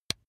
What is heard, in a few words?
razor attachment mount